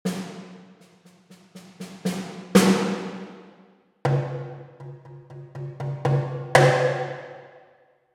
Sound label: Orchestra High; Spitfire Audio Abbey Road